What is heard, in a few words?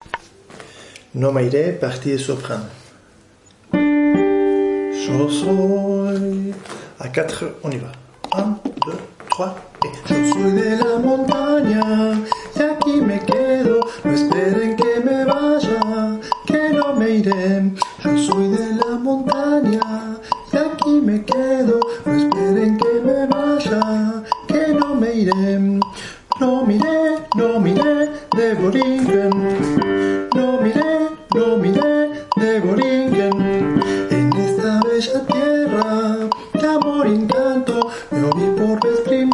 Soprano